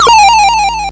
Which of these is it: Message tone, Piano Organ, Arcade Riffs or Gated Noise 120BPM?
Arcade Riffs